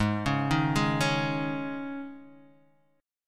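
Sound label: E flat 7th Suspended 4th, A flat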